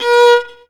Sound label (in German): GEIGE, Alpine Volksmusik